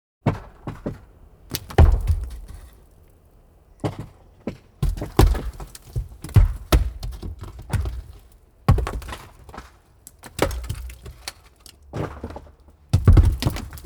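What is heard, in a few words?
big stones boulders falling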